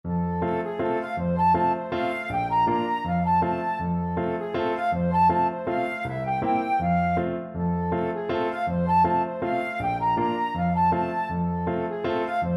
Zimmermo flute

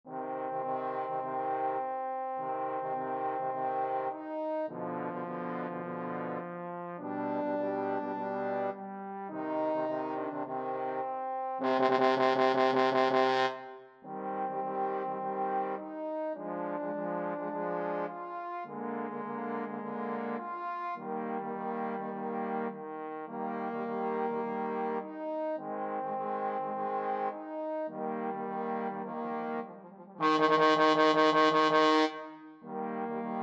four seasons trombone trio

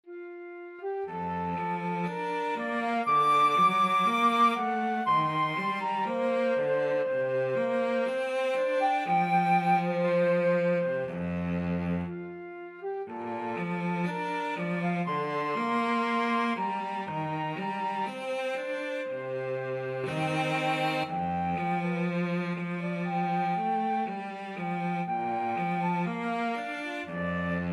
ponce estrellita, flute cello

